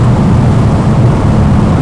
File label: After Burner; PC Gamer IT CD 47; USAF; USAFDEMO